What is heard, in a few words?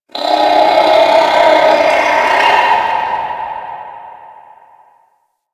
Infested Hive One Off; Ambience; Props; Warframe; Corpus Infested